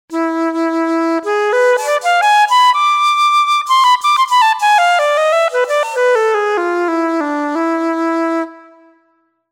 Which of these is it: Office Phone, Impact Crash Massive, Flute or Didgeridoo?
Flute